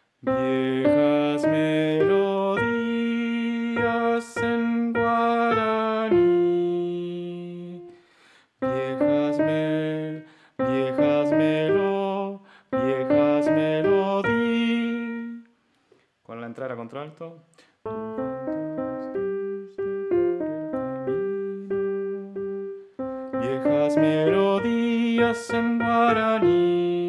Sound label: Soprano pasajes